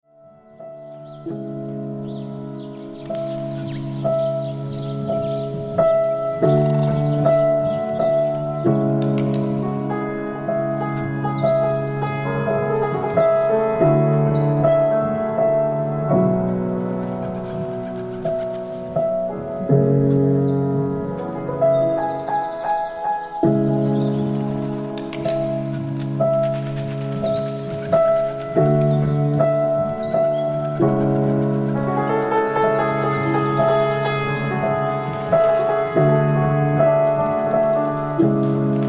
piano and wings

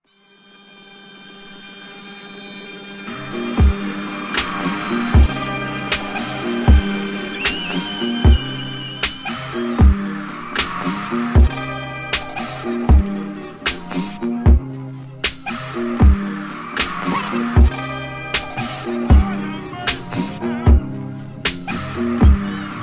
crowd noise

